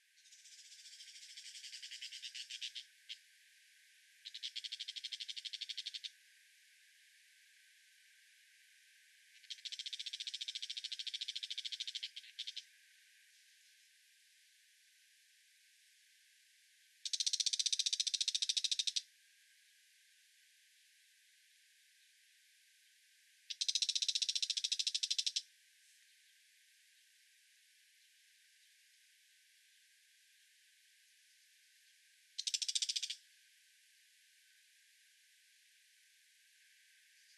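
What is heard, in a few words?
brown shrike, bird; akamozu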